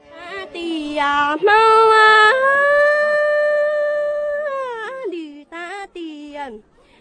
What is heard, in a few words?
miao female vocal sung, love songs